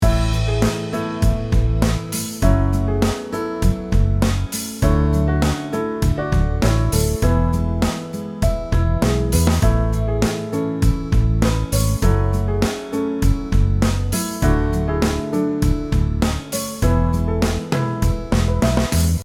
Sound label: beautiful melodies